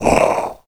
troll die; wesnoth

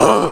orc small hit; wesnoth

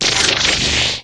magic dark big; wesnoth

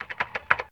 chat friend; wesnoth

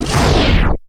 lightning miss; wesnoth

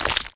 skeleton hit; wesnoth

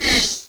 hiss big; wesnoth

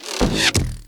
bow puny; wesnoth

